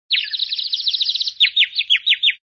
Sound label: Stunned; moonstone madness